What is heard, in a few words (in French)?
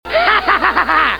Ah ah ah homme, Rires